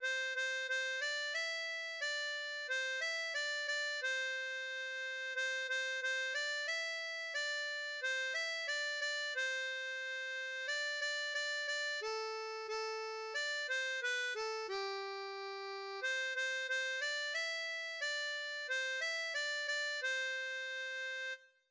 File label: Au Clair de la Lune, harmonica; Do